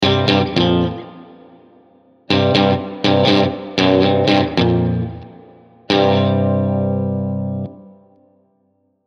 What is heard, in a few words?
riff; or50; IK Multimedia AmpliTube Orange